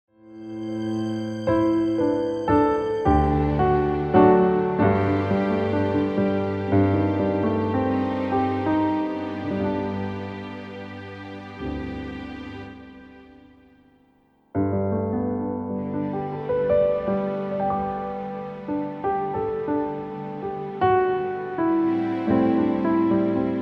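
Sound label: Lord's prayer instrumental; KJV version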